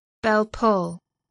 bellpull

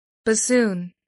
bassoon

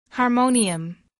harmonium

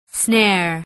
snare

